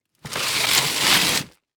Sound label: Industry Crate Pull Fast